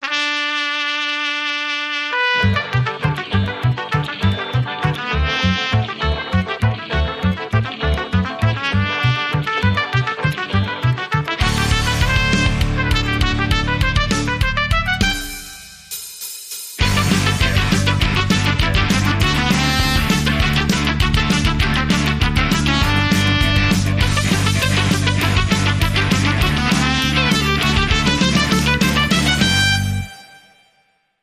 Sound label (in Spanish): Tocar la Trumpeta